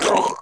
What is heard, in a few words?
gurp